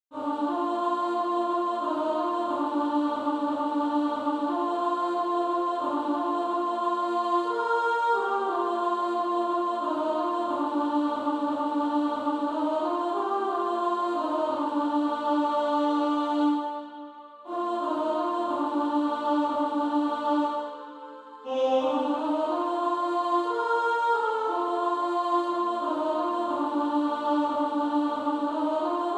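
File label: Female Choir, alto 2; O how I love Jesus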